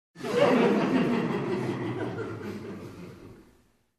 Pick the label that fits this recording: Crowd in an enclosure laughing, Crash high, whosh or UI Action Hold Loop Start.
Crowd in an enclosure laughing